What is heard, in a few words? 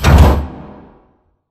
pod door sm verb close start; Titanfall 2